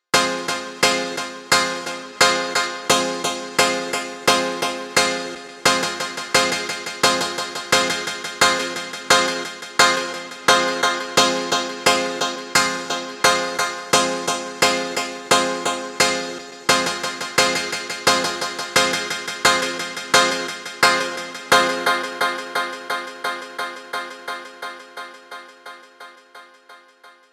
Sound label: Piano Skank, Ping Pong Skank